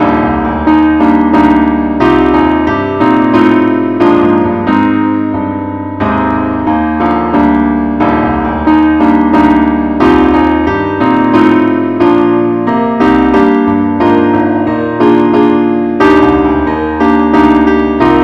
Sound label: keyboard only key ees